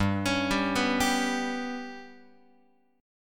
G Major Flat 5th G down Guitar 4A 4E 5A 5D 5G sharp 6C sharp; GMb5 arpeggiated; JGuitar